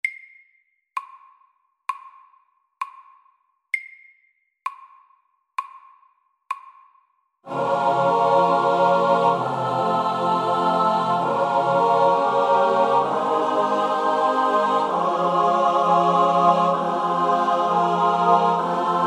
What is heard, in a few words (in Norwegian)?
koral SLOW 65